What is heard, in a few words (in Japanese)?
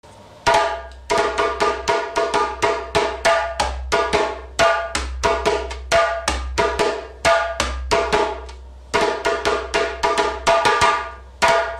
otama oto, djembe